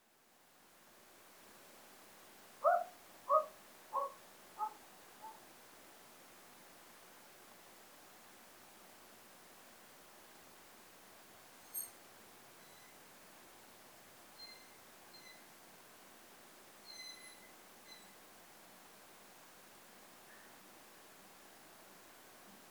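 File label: Japanese Scops Owl, soeda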